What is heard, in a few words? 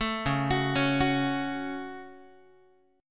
C sharp Suspended 4th Sharp 5th, Guitar; arpeggiated; JGuitar